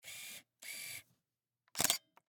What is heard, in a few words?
Camera, Pentax KS2, DSLR, electronic, mechanical, focus, out in, snapshot, quick; Eiravaein Works; Vaeyan IV